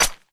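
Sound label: Disc Ricochet Stone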